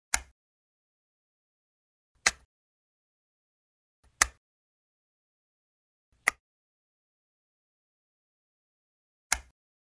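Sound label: checkers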